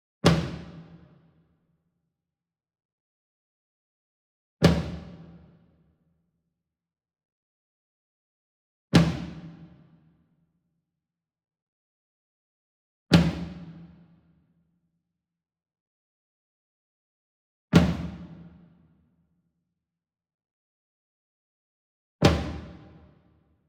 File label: SINGLE DRUM Tom Concert Flam Soft Beater Medium; boom library; Cinematic Strikes Construction Kit